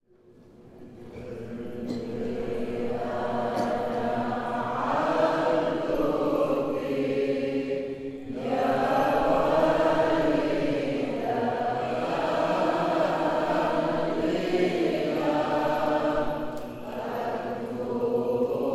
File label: Akathyst Kontakion (Arabic), Hymns; St Nicholas Punchbowl; Inni Anna 3abdoukeh